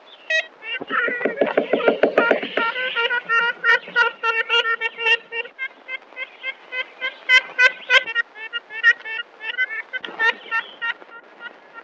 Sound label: Ivory Billed Woodbecker; recently rediscovered; Lime Kiln